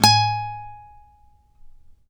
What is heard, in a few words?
acoustic G#4 R, guitar; East West; Steve Stevens Guitar Samples Collection; ACOUSTIC PCK